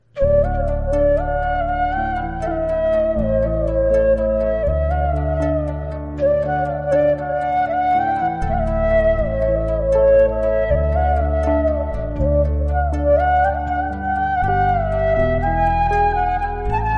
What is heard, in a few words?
voilin, ringtone